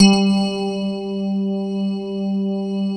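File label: Rhodes G2, left; East West - Phat & Phunky CD2; 90's Sample CDs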